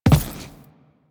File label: Footstep Default